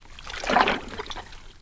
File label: wade; lmctf